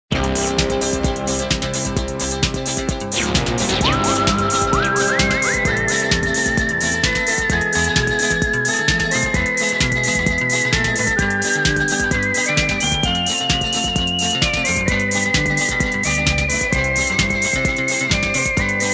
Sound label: Theme Instrumental; Hum Naujawan